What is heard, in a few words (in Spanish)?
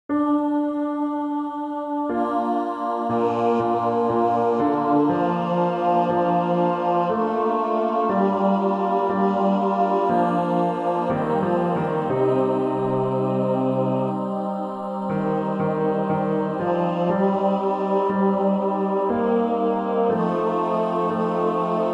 Las Posadas tutti